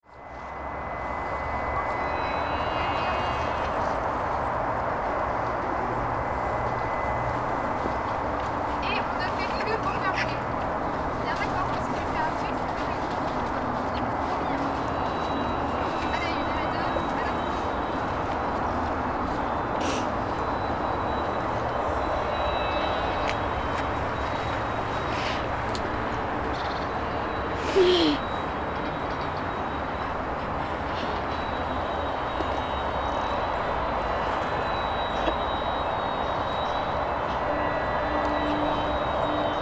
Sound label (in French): parc des Essarts